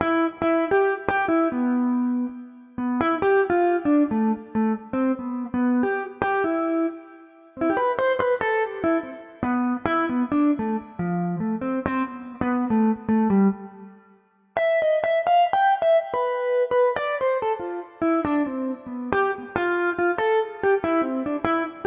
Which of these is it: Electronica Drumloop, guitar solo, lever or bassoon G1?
guitar solo